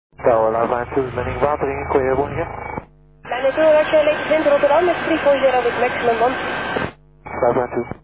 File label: landing; Scanners; Wateringen